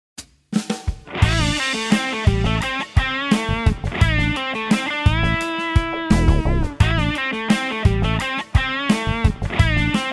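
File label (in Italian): Pentatonica minore con tre note; Guitar Club Dicembre 2015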